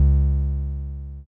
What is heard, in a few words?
bass